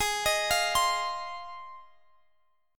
Ab 6th, chord

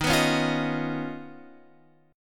Eb 7th Suspended 2nd Sharp 5th Eb, Guitar Open F; x,10,11,8,8,10; Eb7sus2#5 strummed; JGuitar

